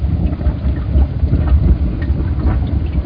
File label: sewr flow